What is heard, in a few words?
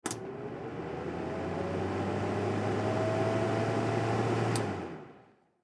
Fan Click On Run, Fan Click On Run Short Click Off; Fans; Electronics; Household; Blastwave